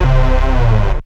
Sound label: 303-Style single hits